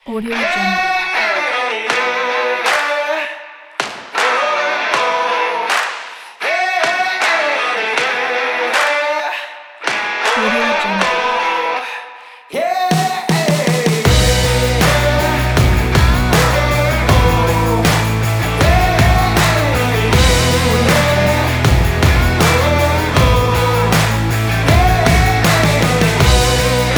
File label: energetic indie commercial rock music